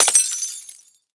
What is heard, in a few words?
glass; Minecraft; Votive World